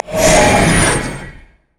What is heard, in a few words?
shackle dash; LoL; thresh